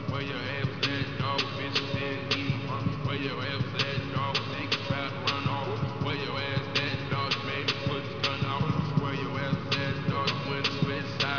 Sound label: stadium music